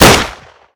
luger Shoot; Minecraft; Infamy; Last Alive Pack

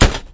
plastic box impact bullet; physics; Garry's Mod; hl2

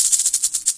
rattle, headcrab poison; Garry's Mod; hl2

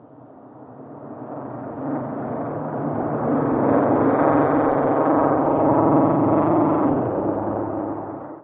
teleport weird voices; Garry's Mod; hl2; labs

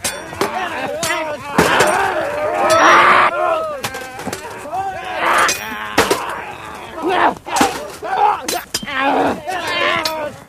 battle; Mount&Blade Warband

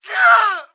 normal dying; Emperor - Battle for Dune

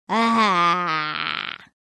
ahh; taunt; Age of Empires II HD; zh

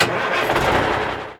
select vehicle; Hearts of Iron IV Death or Dishonor

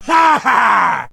man yell; Mount&Blade Warband

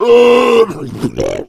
male boomer spot prey, voice, attack; Left 4 Dead 2

